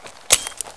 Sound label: pin pull; svencoop; paranoia; weapons